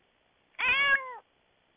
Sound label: cat meow